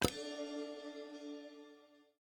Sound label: button Click Continue; imperialriches